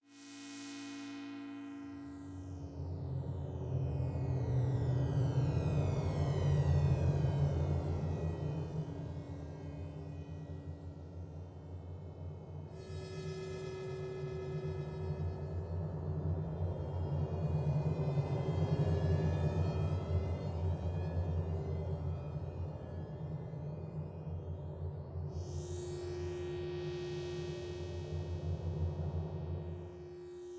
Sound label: game loop; horror game